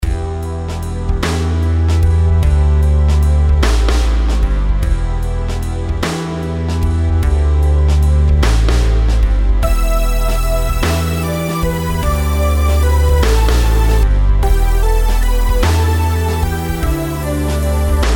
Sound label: rhythms compound 8s